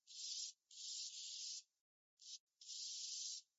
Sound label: Painting